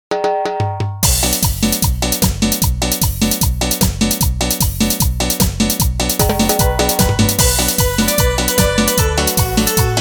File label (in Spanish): Instrumental Chicha, BPM 151